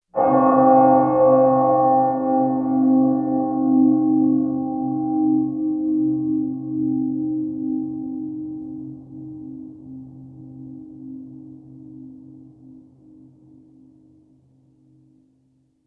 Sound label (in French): cloche